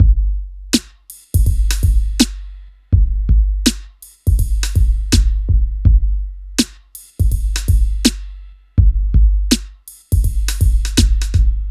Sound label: DRM, Hip Hop and R&B Drumloops; Best Service; ProSamples vol.01; AKAI